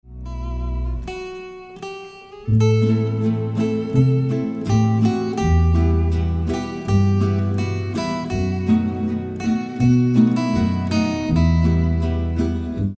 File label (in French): Accord